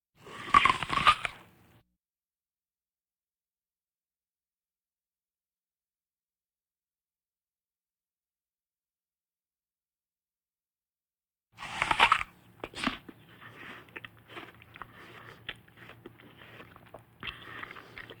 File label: Vocal effects breathing Moaning Baby; Valentino Production Sound Effects Library CD50